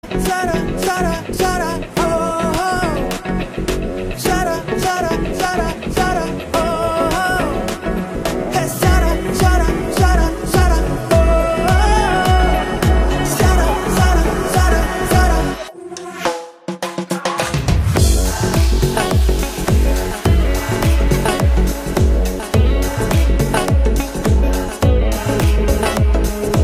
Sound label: Tamil Pop Music Ringtone